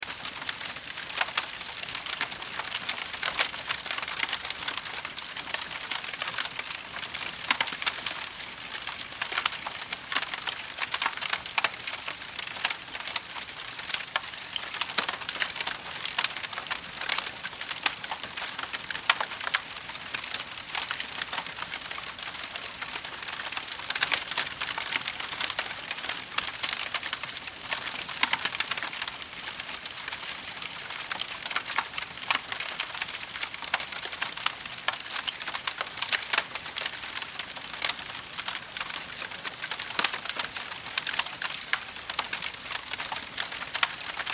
Campfire Bonfire Camping Wood Crackle Loop; Flames; Flame Sound Effects

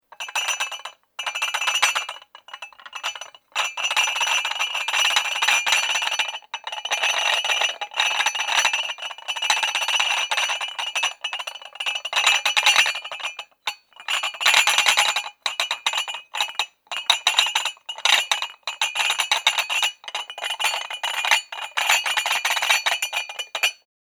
Shaky Crockery, Rattling shaking dish ware.; Objects

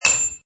Metal Light ding; Metallic; Multimedia

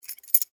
Key Chain Grab, Movement; Foley; Jangling Sound Effects; Objects

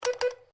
MORSE CODE I; Morse code aplphabete; Morse Code (Sound Effects:Electronics)

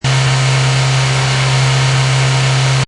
Car Motor Loop Rpm 4000 Engine Perspective; Car Sound Effects; Cars (Sound Effects:Vehicles)

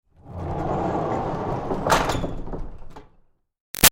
Door Barn Rolling Metal Clang; Barn Doors; Big Room Sound

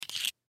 Belt Cloth Slide, Belt Cloth Slide Out Of Buckle; Slides; Foley

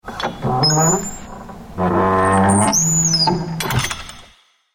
Wooden Gate, Opening and closing of a wooden gate; Gates; Doors

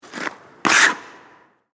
Ice Skates Jump; Sports, Land, Skid, Indoor, Ice, Rink

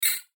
CLICK METALLIC AIRY; Clicks; Blastwave

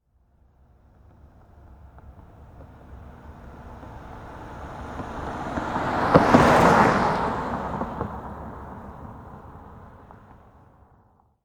Auto Pass By Car Automobile Mono; Transportation; Car Sound Effects; Cars (Sound Effects:Vehicles); SFX Bible 6

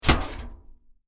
Metal Impact Trash Can Lid Drop; Metal trash can close lid. Muted, restrained metal hit clank; Metal Sound Effects

